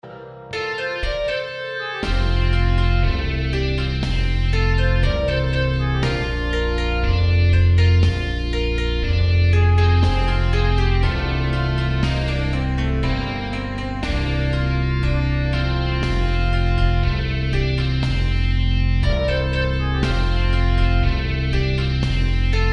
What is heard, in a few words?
instrumental